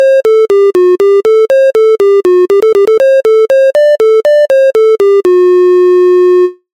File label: diatonic amanecer